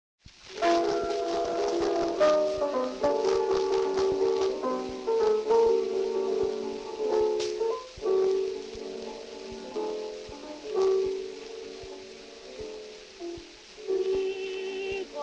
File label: Gramophone